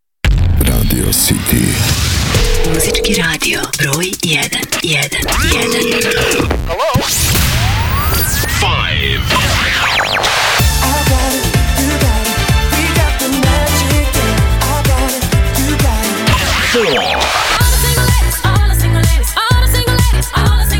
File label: dance chart top 5 beatmix; city radio